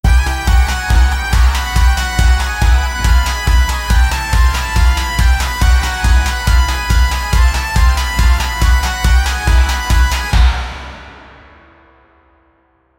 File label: a theme that fits a, chase